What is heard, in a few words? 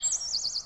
Water Bird, ambience